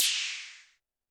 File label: Roland TD-20 Percussion